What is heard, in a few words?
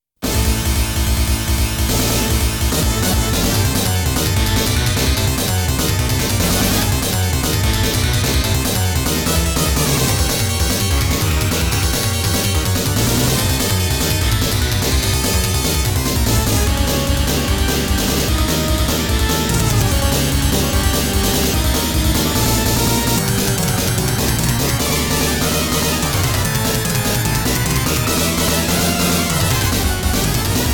Boss BGM; Konami Music Masterpiece Collection